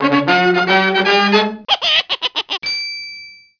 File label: win level; Rayman